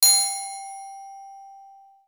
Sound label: Success Chime; Levels Hub Bayou 02 Twitch Waterski; Sfx Success Chime Single